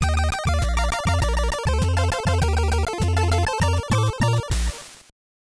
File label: You Found A Card; High Five